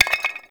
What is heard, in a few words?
Clay Pot Hit Drop; Gameplay; Awakening Remaster